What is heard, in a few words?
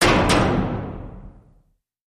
Cell Door Close Metal